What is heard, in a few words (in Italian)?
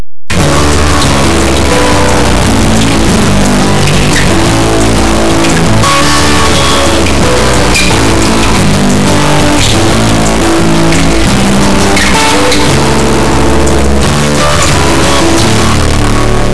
musica newage